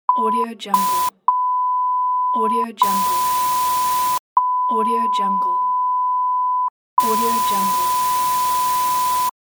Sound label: censorship beep